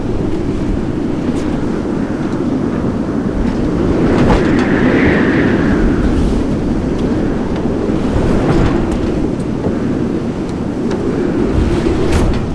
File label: top floor wind loop, ambience; Left 4 Dead 2